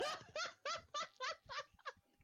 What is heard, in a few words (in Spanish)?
risa